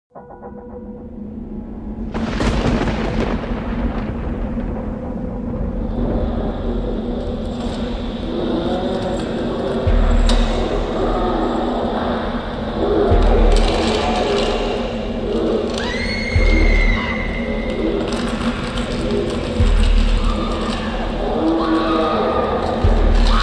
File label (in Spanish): Ambiente; cstrike; AnZ ZM Sonido